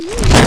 fall; critterz

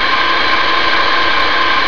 buzzsaw

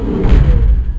step

